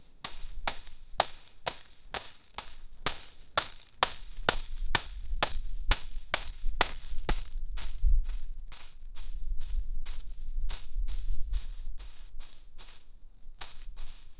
pounded rice the sound of, agricultural

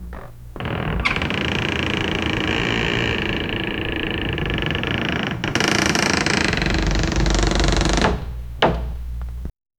ambient wooden door creak; building

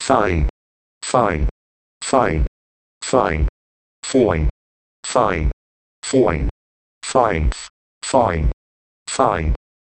red light countdown, alerts

